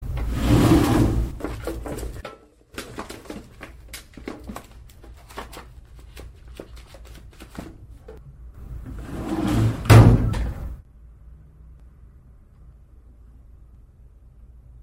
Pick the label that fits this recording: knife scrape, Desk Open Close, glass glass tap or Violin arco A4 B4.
Desk Open Close